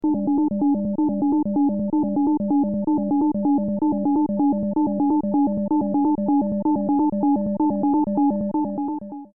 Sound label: melody